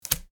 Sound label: card place